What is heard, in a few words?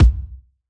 kiks; drums single hits